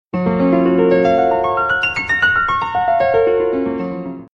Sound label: piano; Quiz